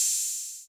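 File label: Open Hi-Hat 808 Saturated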